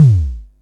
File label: bassy kickdrum sample d key